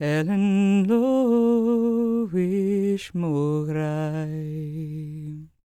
GAELIC, World Voices, GAELIC FEM; ILIO; Vocal Planet

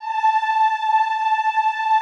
EEH A4, Classical Choir; Best Service; Extended Classical Choir

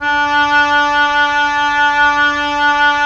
OB, Oboe; Roland - LA Composer vol. 4

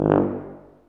TUBA, Tuba stacc; Roland L-CDX-03 Disk 2

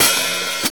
HAT SOFT PDL, VINTAGE FUNK; Zero-G; Funky Elements

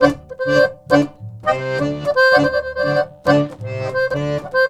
Balkanish Traditions; USB Soundscan vol.63; AKAI

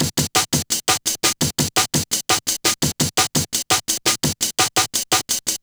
Kickin Break, Drum & Bass, Beats & Breaks

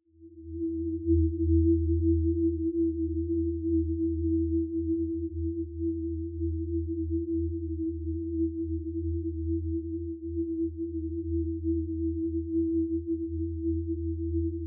Ambient, pad; M8

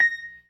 PERC, ORGAN; Keith Emerson Signature Series

